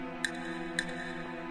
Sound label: spooky clicky verby; Atmospherics